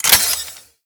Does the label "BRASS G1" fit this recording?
No